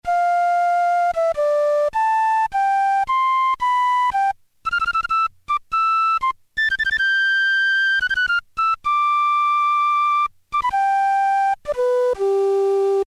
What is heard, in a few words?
flute, Technics SX-K450 PCM Solo Presets